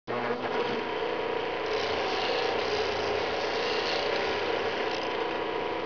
sander; 10,000 Graphics Pack Volume 1; Media Graphics International

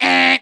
Buzzer

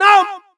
yells

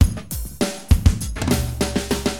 DM LOOP, HipHop, Drums

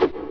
SWORD; GoD

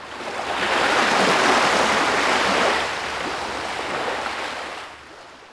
wave lapping; DAoC

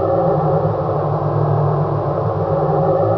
new hum, ambience; Hexen2

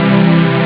loop, synth

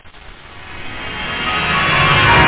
SWOOSH

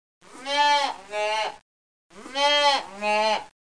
COWS, ANIMALS

